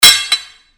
Sword Sound; FXHome; Fighting Sounds

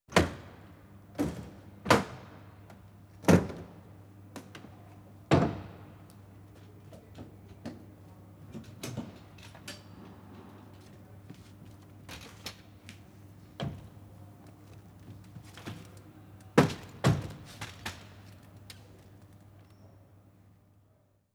Rear Doors Opened And Stretcher U; Emergency; BBC Sound Effects Library

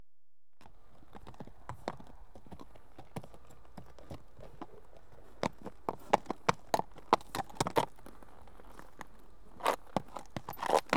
One horse walks on approach, on t; Horses; BBC Sound Effects Library

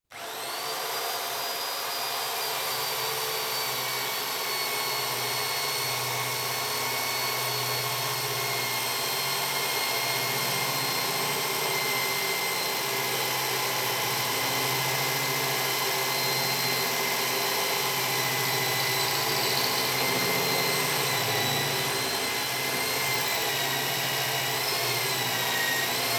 Electric Drill, Single Hole In Th; Construction; BBC Sound Effects Library

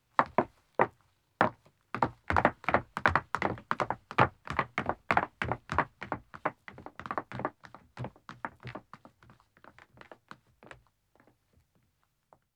Wooden Stairs Descending, 2 Wo, Footsteps; BBC Sound Effects Library; Footsteps II

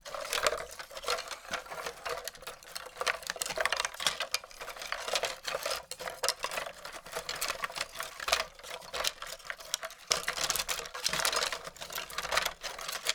wood rattling debris; The Soundcatcher; Desolated Strings and Wood

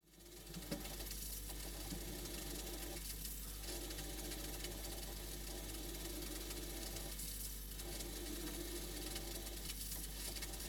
FRIDGERATOR INSIDE; The Soundcatcher; 365 Various Sfx

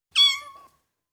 Ten Week Old Kitten, Individual M, Cats; BBC Sound Effects Library

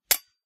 sword; Kenney's Sound Pack

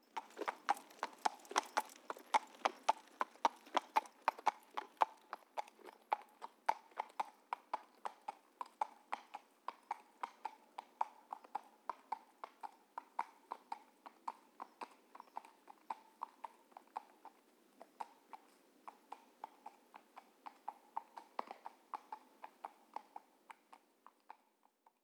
HORSES - Walking on stone; G4F SFX

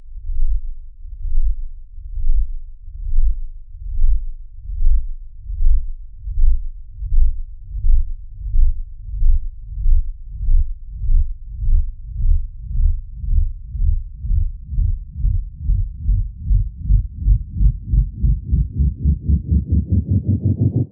Heartbeat Getting Faster; 2496sfx; TheHorror

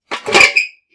metal Pot; Kenney's Sound Pack; RPG Audio

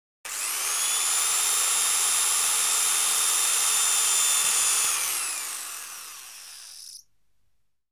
Electric Drill, Single Hole Drill; Household; BBC Sound Effects Library

